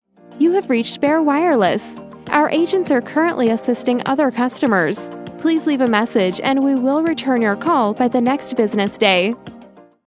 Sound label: music; BearWireless